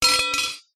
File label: metal pan close down on pan; household; zapsplat